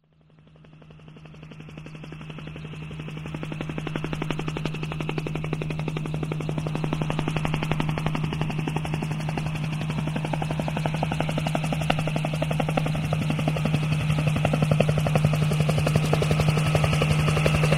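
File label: helicopter; zvuk vertoleta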